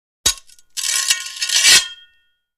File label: Sword Scrape On Stone